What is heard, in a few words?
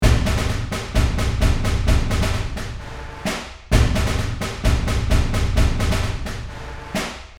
Field drum marching loop